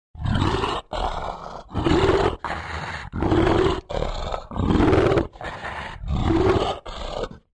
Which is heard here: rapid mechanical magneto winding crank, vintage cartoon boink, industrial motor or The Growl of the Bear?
The Growl of the Bear